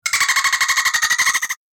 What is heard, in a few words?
Dolphin chirps and clicks